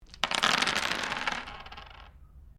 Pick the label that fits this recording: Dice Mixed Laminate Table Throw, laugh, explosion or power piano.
Dice Mixed Laminate Table Throw